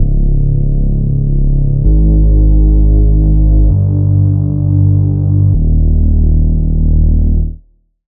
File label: Reese; Routed Sound; Spire Expansion - Noble Gangster Vol.1